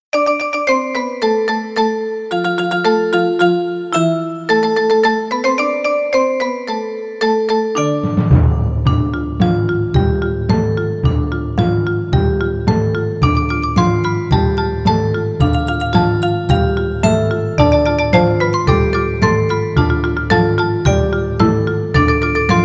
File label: Canon in Dorian